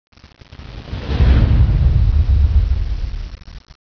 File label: camera